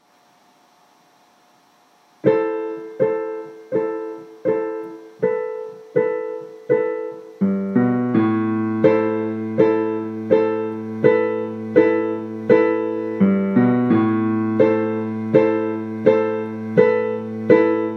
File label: Dino Piano Song